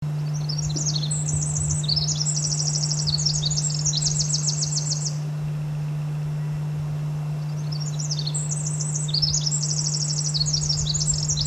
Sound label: winter wren song